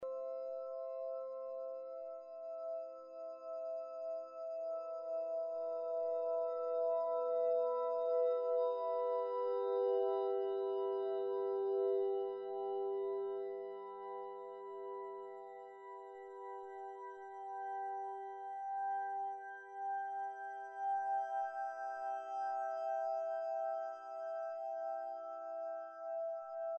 pad, ambient

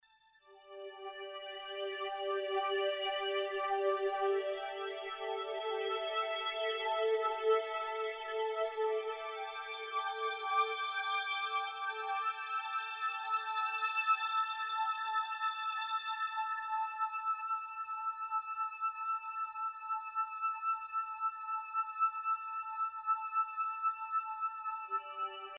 pad, ambient

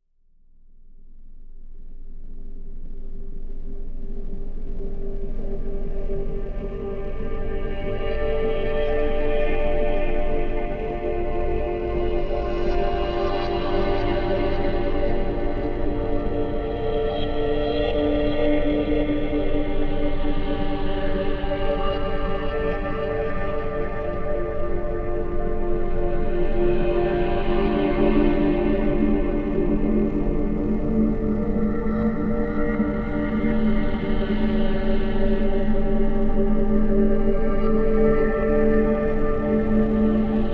Wasteland Echoes - Dark Ambient Exploration Music; Mixes and Soundscapes

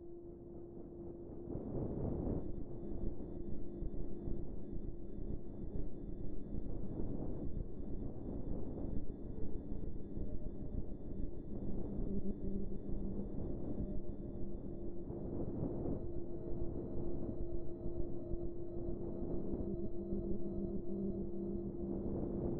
ambience, dark ambient

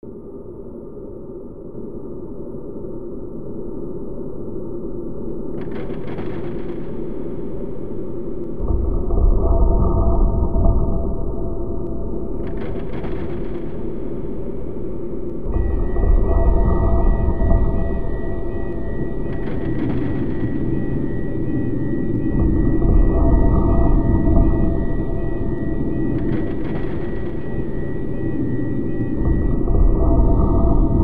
dark ambient

